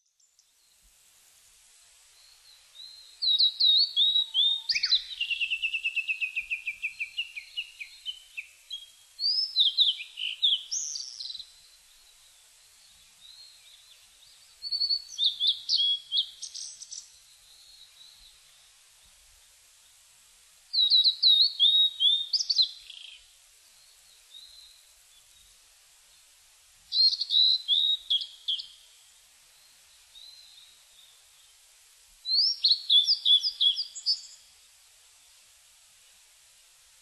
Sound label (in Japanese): ooruri